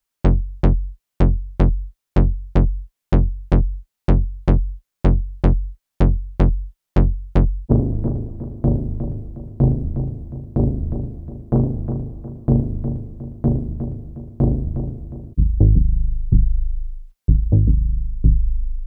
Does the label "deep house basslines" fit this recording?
Yes